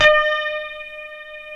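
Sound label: E 5 harmonic, guitar; Spectrasonics; Hans Zimmer Guitars VOL-1; 90's Sample CDs; HYPEMONICS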